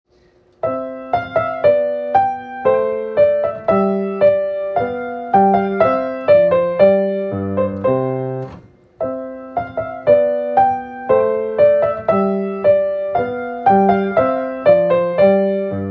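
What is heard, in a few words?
Gavotte, Piano; Handel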